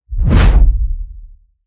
Wipe; Conversion Wipe; Ninja Tracks

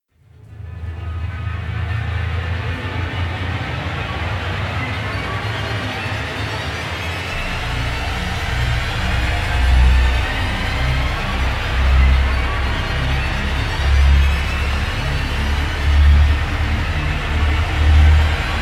Outcry Rise; Ninja Tracks

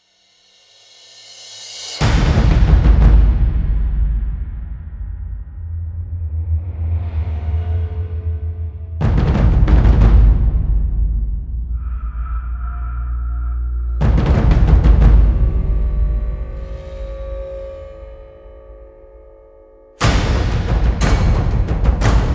Lead the Charge, Percussion; Relentless Percussion; Gothic Storm Music

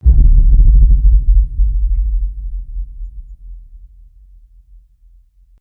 Meltdown Hit Sub

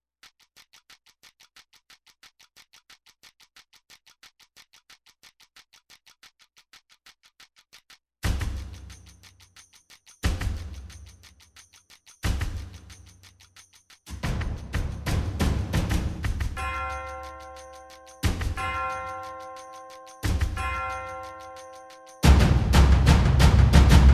Perc Only; Brand X Music

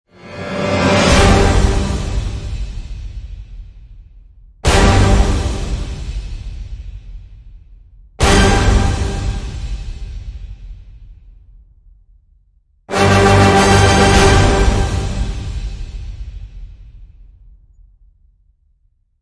Orchestral End Hits (G); Epic Tools vol. 1

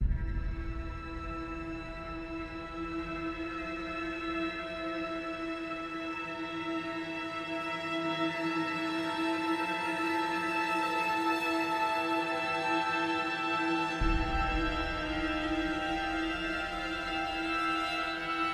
Outburst Ambient

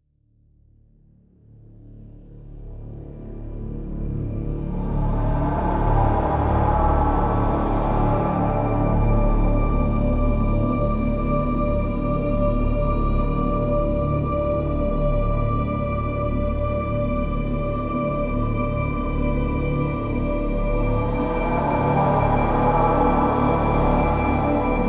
Paranormal Suspense, drone; Gothic Storm Music

